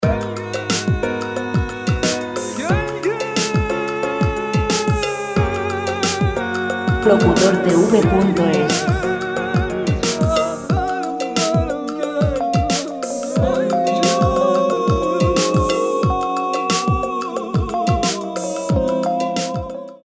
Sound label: peine 90 vocal; Música vocal sin copyright para anuncios